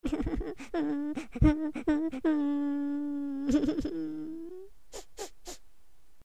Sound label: cry